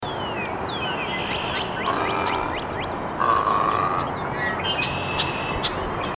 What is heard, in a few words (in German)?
silberreiher